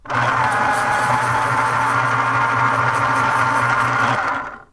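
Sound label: pencil sharpener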